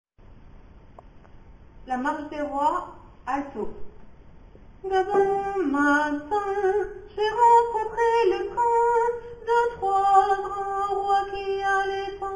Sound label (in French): alto; Marche des Rois